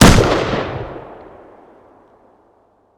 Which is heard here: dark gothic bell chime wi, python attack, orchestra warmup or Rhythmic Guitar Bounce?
python attack